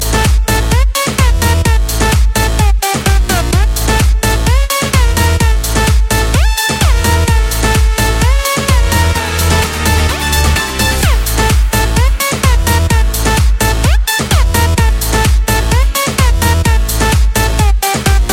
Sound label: electro, ringtones electronic